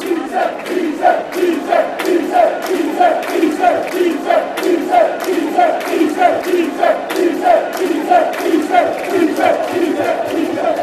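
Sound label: fanchants, football chants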